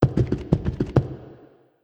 wild Movement; netent

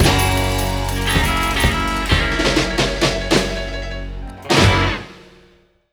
big Win Loop End

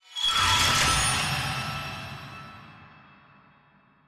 FS wheel turn; netent

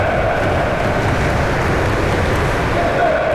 crowd loop France; netent

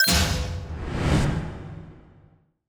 wheel end; netent

